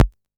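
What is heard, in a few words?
Analog SR88 Percussion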